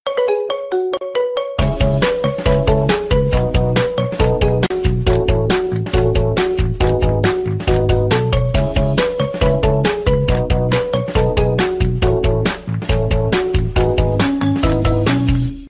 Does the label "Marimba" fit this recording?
Yes